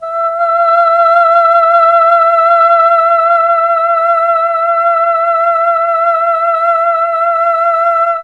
E3 FEMALE, mellotron; CHMB FEMALE

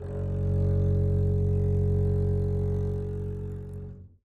gb1 down, bass arco; meatbass

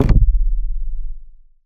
kick retuned c; kicks

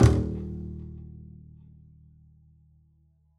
pizz eb1, double bass

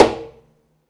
pizz noise body slap high, double bass

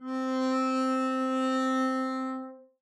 arco c4 mf up, double bass

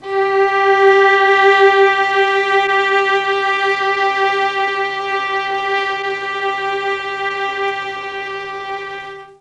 G4 300 VIOLA, mellotron, STRINGS

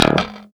db2 staccato, glowly bass

